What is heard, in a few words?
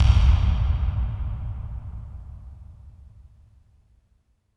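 bass drum hall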